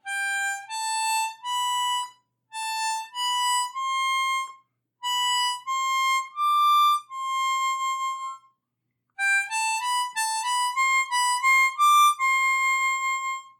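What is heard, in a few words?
C Major Triplets Ascending, harmonica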